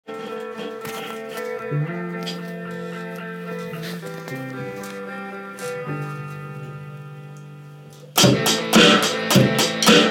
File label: 4 4 rock beat